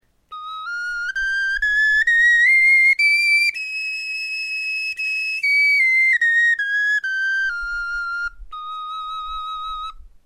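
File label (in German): tonleiter, Knochenflöten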